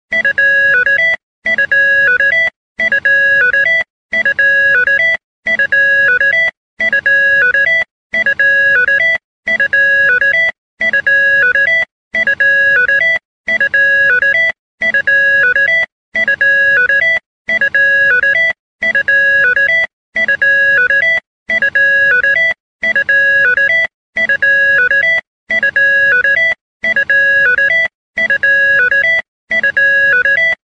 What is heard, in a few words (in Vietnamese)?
Ringtone; Totally Spies